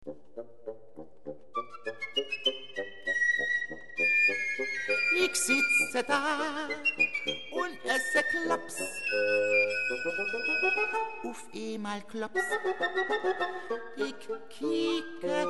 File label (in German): klopslied